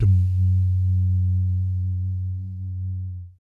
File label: BS DUMM, Vocal Planet Jazz & FX; ILIO; BASS DUMMS